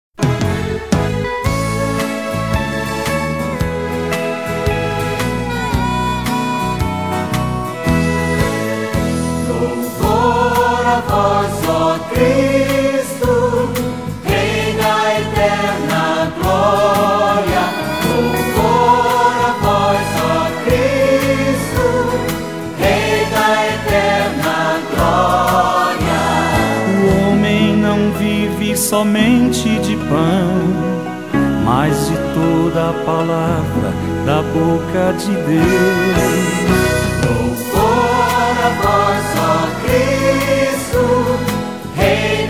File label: vocal